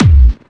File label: Clubby Kicks, Bassdrums